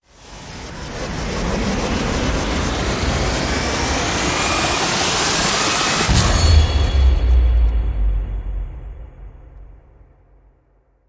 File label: Rise Short